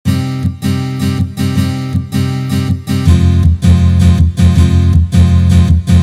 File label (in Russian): boj na gitare